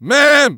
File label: jump; freak fortress 2; meemswarm; engie